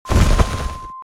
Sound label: flap